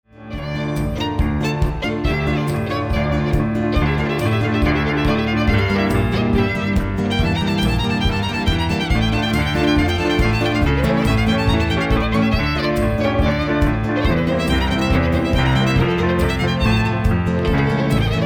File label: CHARDASH